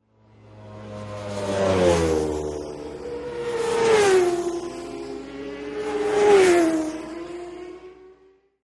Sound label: Motorcycle Road Racing